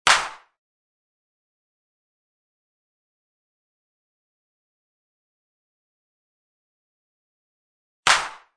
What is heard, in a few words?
120 Klap, 120BPM; Breathing Circle